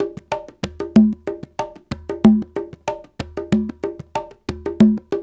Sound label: Soukous; Spectrasonics; Supreme Beats; African Contemporary